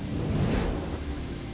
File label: model zoom; sweden